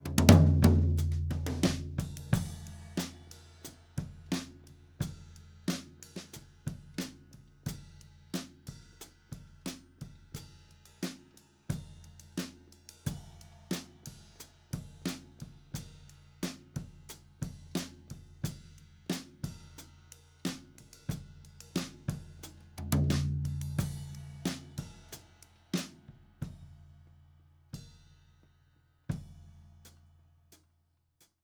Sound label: Tom